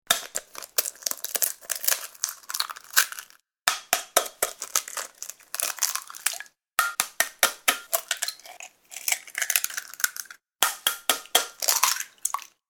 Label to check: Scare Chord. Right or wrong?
Wrong